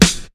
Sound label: clear snare f key; Duration 0:01; Mono; drum single hits one shots; snare drum samples